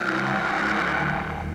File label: Cave